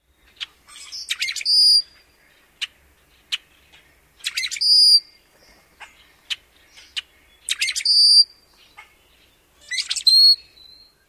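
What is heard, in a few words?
calls and songs; NY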